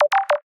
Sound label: success; campfire